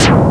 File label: Barrier Gun Fire Laser; Barrack 1.0.0; Barrack Sounds